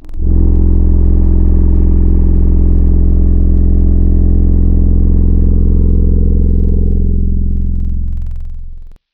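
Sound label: woodwind Clarinet, Wavetables; Synthetic's Serum Collection; Standalone-Music SYMPHONY Wavetables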